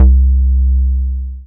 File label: BASS MONO